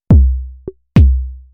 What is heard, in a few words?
Rhythm maker Randomized 140 Bpm Loop